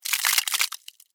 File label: Ice Crack Movement, Scifi; Science, Fiction; BlastWave